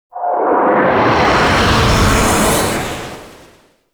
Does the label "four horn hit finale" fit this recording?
No